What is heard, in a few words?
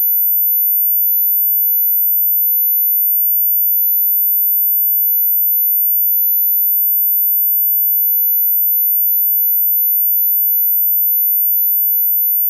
Sharp Soundwave